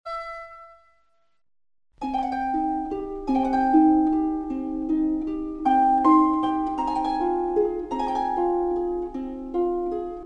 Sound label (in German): harfe